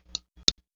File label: Click